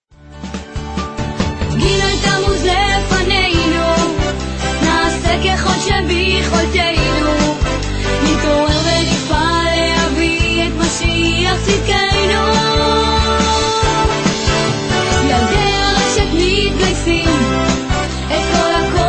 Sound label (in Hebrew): גינגל ילדי הרשת מתכוננים לג תמוז